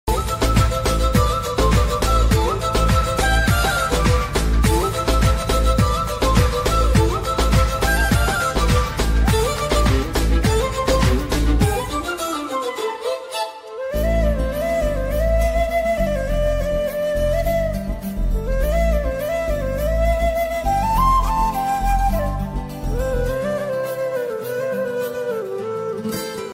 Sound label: love bgm ringtone; bommarillu